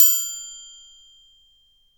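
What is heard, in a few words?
triangle open, GM percussion kit; AKAI S-Series CD-ROM Sound Library VOL-5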